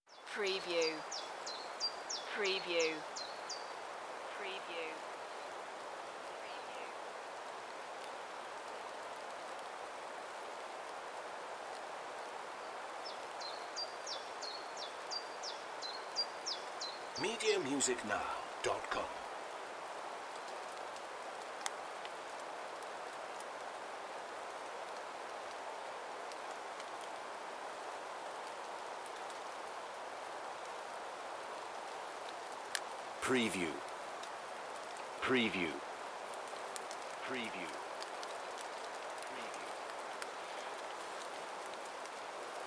Beddgelert Forest - Light rain with evening bird song